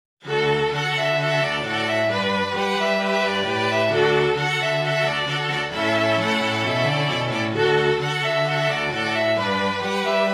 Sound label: STRING QUINTET